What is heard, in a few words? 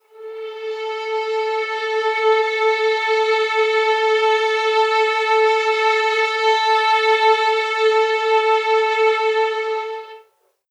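Violin Ensemble sustain Vibrato A3; VSCO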